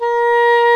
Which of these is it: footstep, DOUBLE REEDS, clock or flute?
DOUBLE REEDS